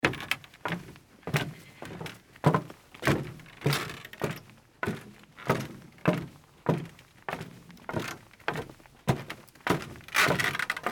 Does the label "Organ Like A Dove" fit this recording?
No